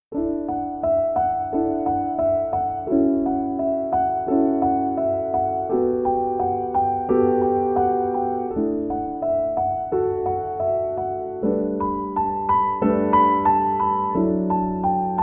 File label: song calm nature; dolphin tunes